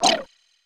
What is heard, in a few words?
Item Throw; Super Smash Bros